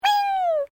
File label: Created Creature Small Exclamation, alien, created creatures, vocalisations and pretend speech; Aliens; SoundEffects Foundation2